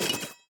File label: tower eldrictch Channelers basic Attack offset